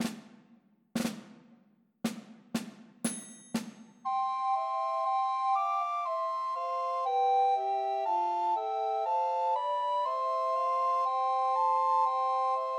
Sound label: Trios for Two Descant and One Treble Recorder; Good King Wenceslas; Christmas